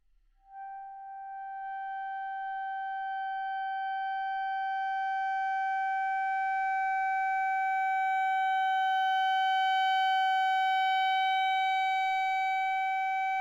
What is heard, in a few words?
classical clarinet c, Anechoic; OPENAIR